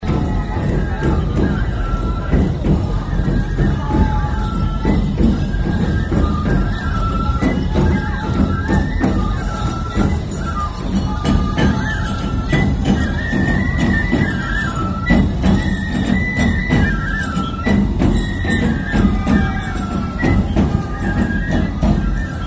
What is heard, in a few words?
festival aomori nebuta festival drums loop stereo